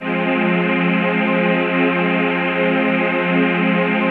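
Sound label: chord pad, Pads; ATMO PADS; Best Service; XX Large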